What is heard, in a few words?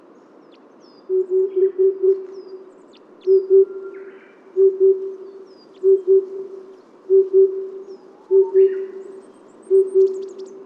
Red Crossbill Type E03 - FCs